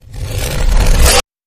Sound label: Ice Carve Reverse